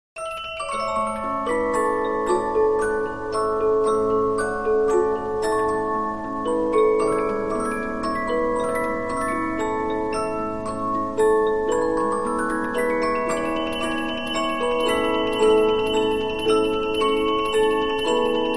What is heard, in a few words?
Polyphon, 15inch disc musicbox